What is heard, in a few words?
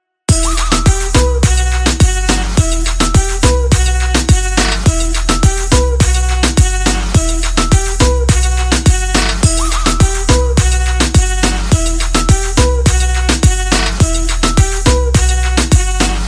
instrumental ringtone